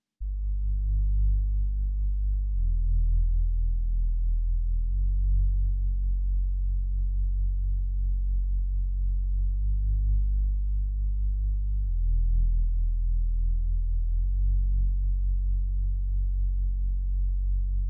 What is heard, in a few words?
103 BPM D Maj Bass; Melody; Loop Stems; Cymatics; 2022 Melody Collection Platinum Expansion; Needed You